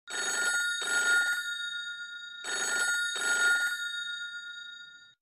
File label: classic bell; huawei harmonyos